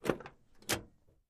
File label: Camaro Door Open; chevy camaro